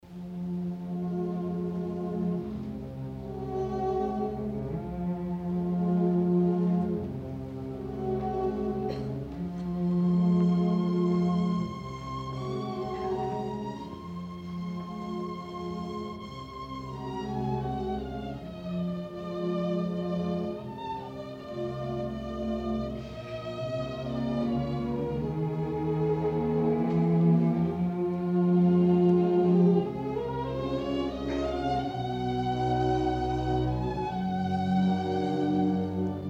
Concerto pour piano trompette et orchestre à cordes en ut mineur Lent; Lento; CHOSTAKOVITCH; op. 35

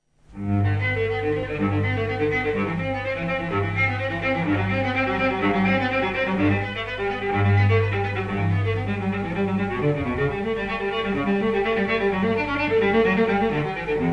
Suite pour violoncelle seul n° 1 en ut majeur Prélude; Bach; BWV 1007

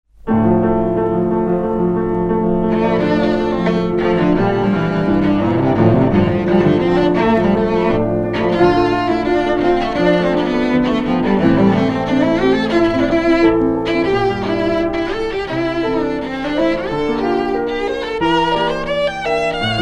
Sonate en ré pour violoncelle et piano Allegro; LADMIRAULT; MAURICE MARECHAL

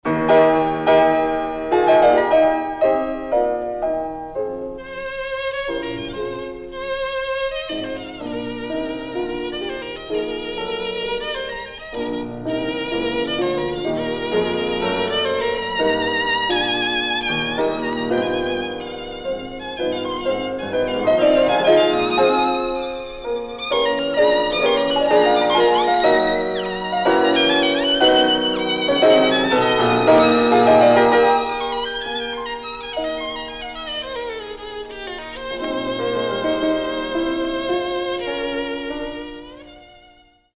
SONATE POUR VIOLON ET PIANO EN MI BEMOL MAJEUR Allegro ma non troppo; RICHARD STRAUSS; OP. 18

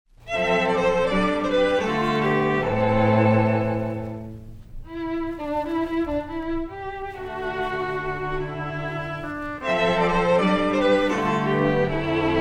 Quatuor à cordes n° 1 op. 5 Rythmique; MILHAUD

